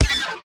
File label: Baboon Take Damage